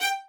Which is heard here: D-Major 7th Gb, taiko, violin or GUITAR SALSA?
violin